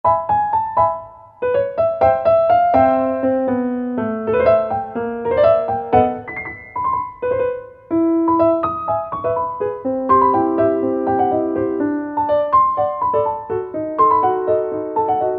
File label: piano; 軽やか 不思議 お洒落; 迷子の針は時空をふらふら。不思議でスタイリッシュな楽曲。